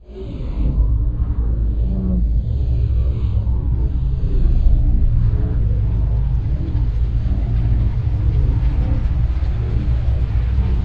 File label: Pit Quake